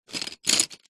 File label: office pencils artists in metal tin roll hand over movement; zapsplat